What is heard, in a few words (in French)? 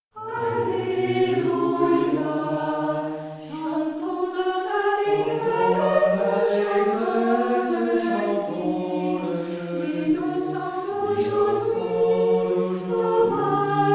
Alleluia liturgie de Pâques